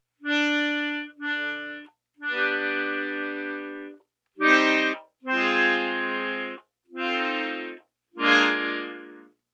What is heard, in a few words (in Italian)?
accord